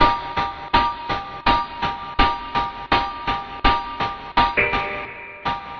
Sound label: 165 milky crunchy dubby chords; KEYS ORGAN PIANO LOOPS